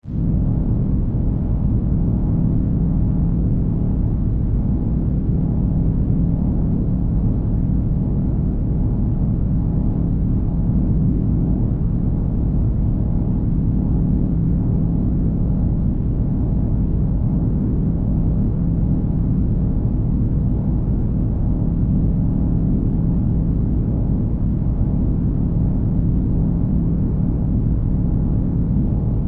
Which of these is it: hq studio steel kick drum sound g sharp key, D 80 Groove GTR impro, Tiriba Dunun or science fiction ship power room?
science fiction ship power room